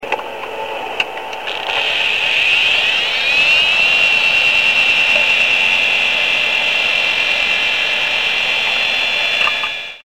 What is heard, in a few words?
hard disk